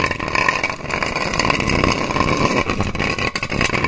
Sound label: soda can scrape rough loop, metal; physics